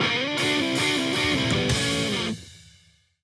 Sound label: Baseball